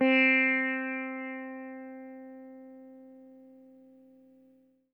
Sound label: EL SITAR SFT; Quantum Leap - Guitar & Bass